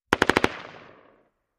M-16 Short Barrel Machine Gun Burst From Distant Point of View